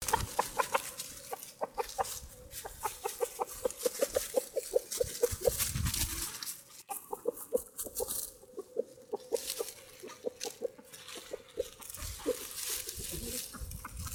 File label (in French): coq qui appelle ses poules